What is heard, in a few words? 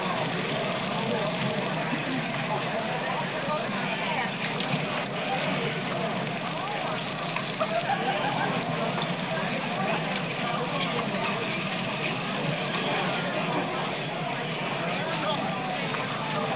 casino; Bangkok Nights